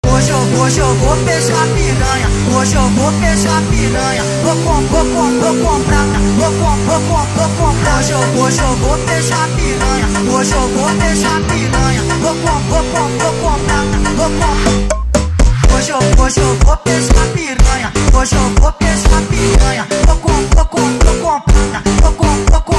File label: funk sped up